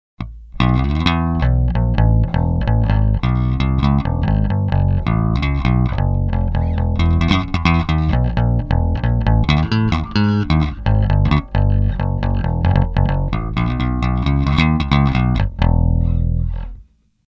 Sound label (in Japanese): TAURAMアクティブ・ピック弾き, ベース